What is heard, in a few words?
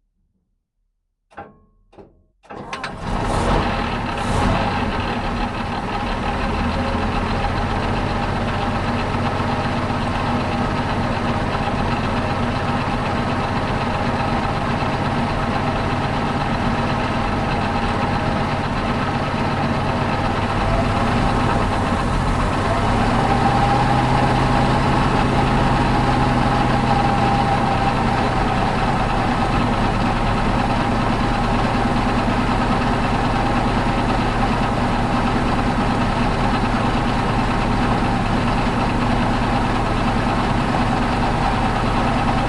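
panther onboard start slow drive stop off engine mix; Sonniss GDC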